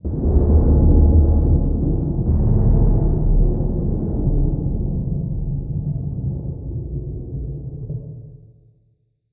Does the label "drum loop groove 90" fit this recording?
No